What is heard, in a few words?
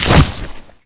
jump grass; STALKER; IWP